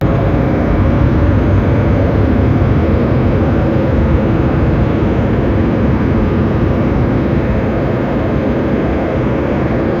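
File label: aircraft turbine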